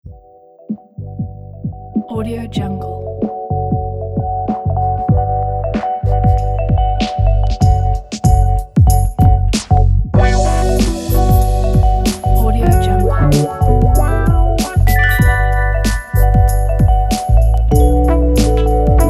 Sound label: hiphop; music-for-video